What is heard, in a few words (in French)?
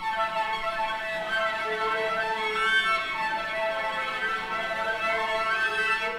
harmonique